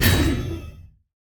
heroes basic Attack cast; stregi

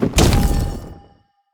prismatic shard cast; heroes kosmyr

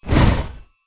dragon birth; hero

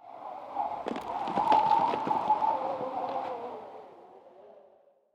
Ambient wind Rocks

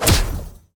pandas melee; wukong tower

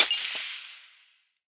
enemy skunk Bombardier basic Attack impact

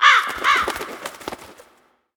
crow

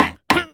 tree wakeup; crocs

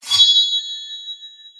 logo shimmer

